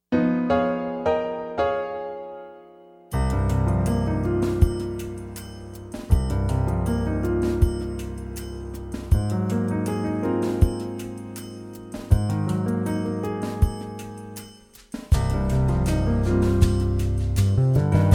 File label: Funky Dark